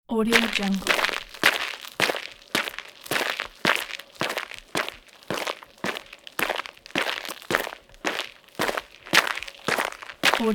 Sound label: footsteps on wet street